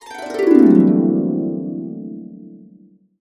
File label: C, Harps; USB Soundscan vol.62 - Complete Harps; AKAI